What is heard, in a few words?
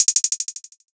Fading Hihat Roll 125 BPM; Drum Loops; Cymatics; Cymatics - King Drum Loops (Teaser)